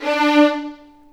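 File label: D4 violins; The Ultimate String Collection; East West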